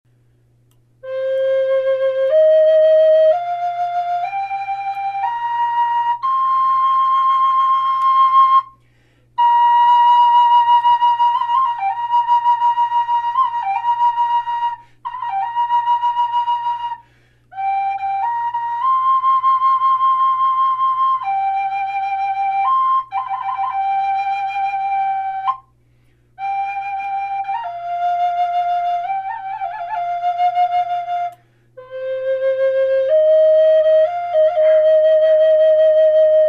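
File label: natural, flute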